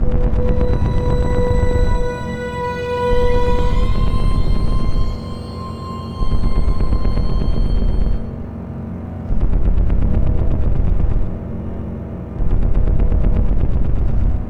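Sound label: Punch Loop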